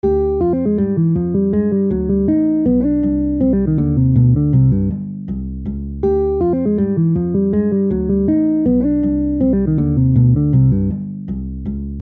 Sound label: minor 2 5 1